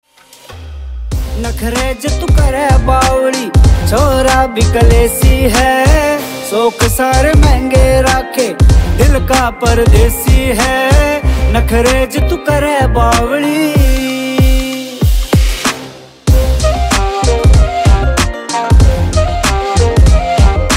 Haryanvi Song

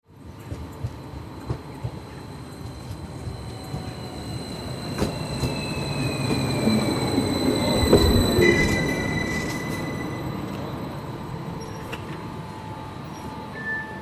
electric train arriving at a station sound effect

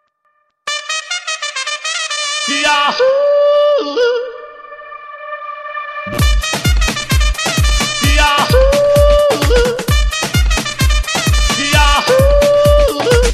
remix; ipl yahoo

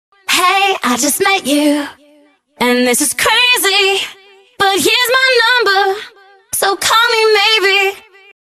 ringtone

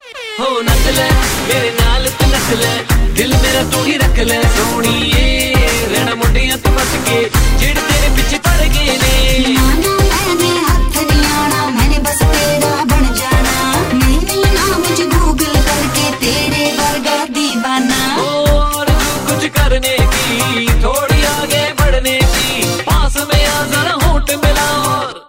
whistle chorus

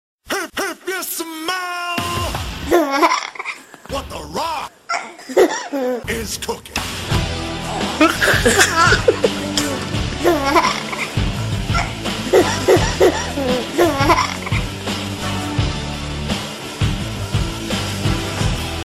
baby rock

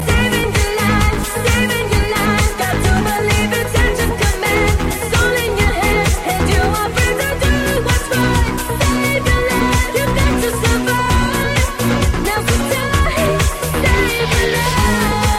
trance

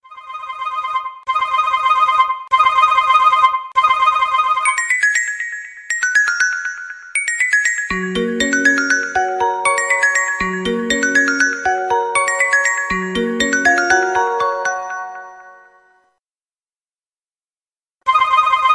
iphone 5 alarm buzzer

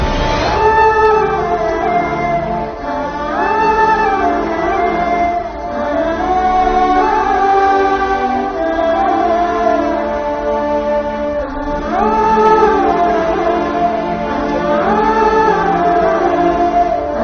Sad BGM

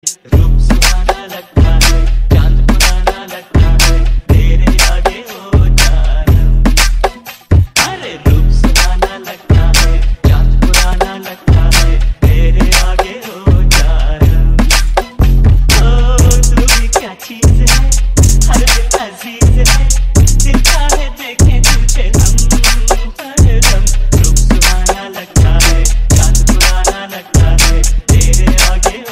Trap Mix

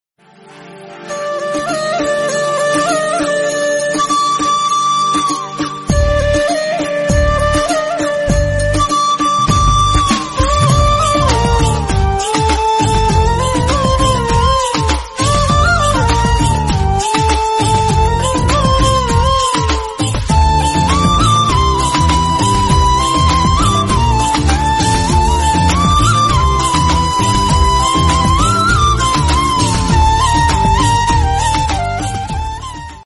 flute, tamil

